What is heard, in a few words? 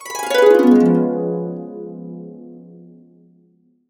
DB7, Harps; USB Soundscan vol.62 - Complete Harps; AKAI